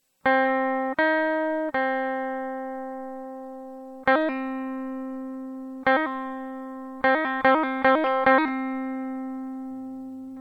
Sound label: hammer pull